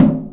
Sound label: metal box impact soft